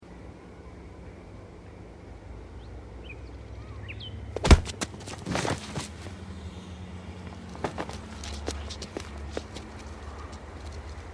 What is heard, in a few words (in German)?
parking hintergrund; life is strange